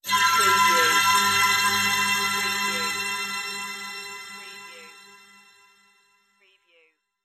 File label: bonus item collect; amusement park arcades; game sounds; the sound pack tree